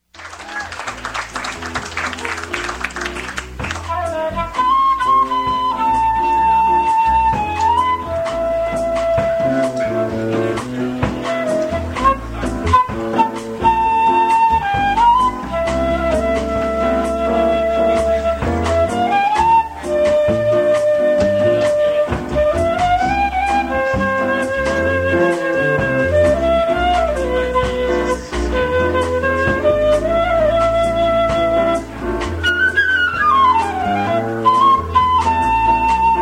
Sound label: latin jazz